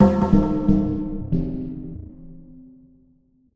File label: fanfare discovery; Spiral Knights